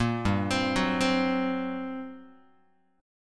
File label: G Minor Major 7th Double Flat 5th, G, Guitar; arpeggiated; JGuitar